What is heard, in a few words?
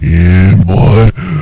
zombie voice idle